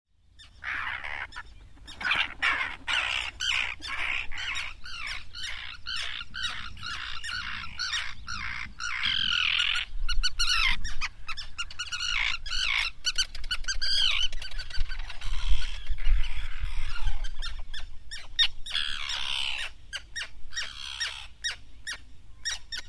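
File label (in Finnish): kalatiira, lintuäänet; Toistaa varsinkin varoitellessa omaa nimeään ”tiir-ra”, yleisesti kovia ”ti-ti”-ääniä; birdlife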